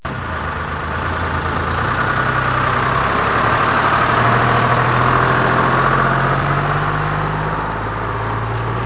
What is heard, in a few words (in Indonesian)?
suara mobil